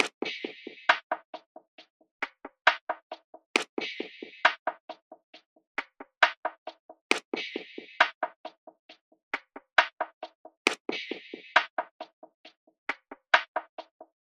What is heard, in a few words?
Drum Loop 135 BPM Percussion; Loop Stems; Cymatics; DOPE DRUMS VOL. 1; Chemist Drum Loop